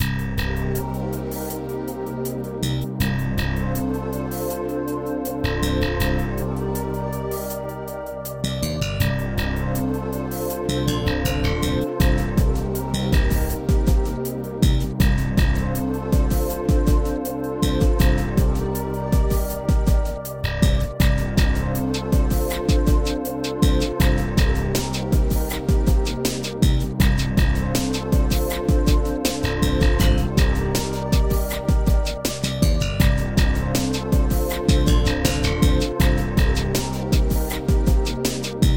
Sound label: Acid Rain